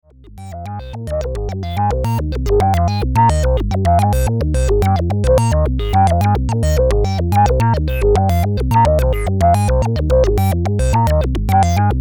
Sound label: Cwejman S1 MIDI Controller